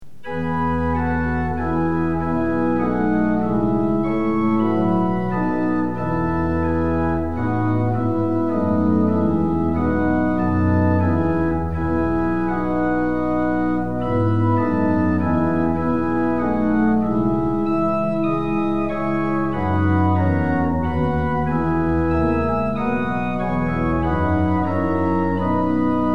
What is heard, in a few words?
tune; hymns; lillenas